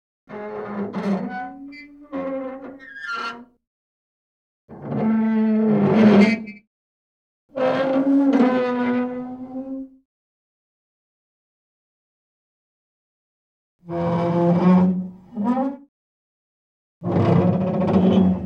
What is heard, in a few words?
metal scrape; Asian Show Sound Effects